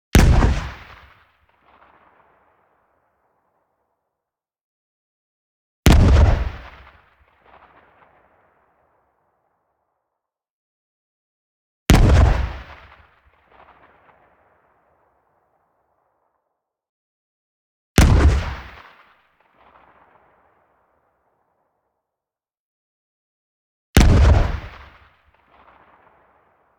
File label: EXPLOSION SHORT Dull Poof; boom library; Anime Essentials